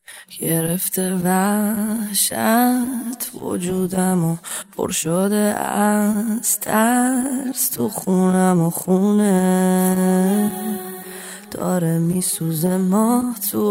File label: Vocals